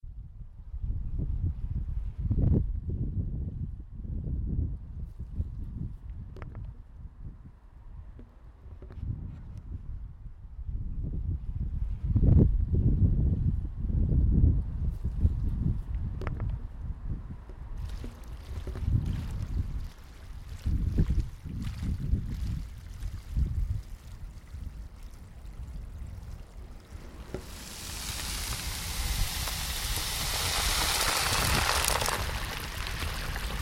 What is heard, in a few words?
soundscape, river sounds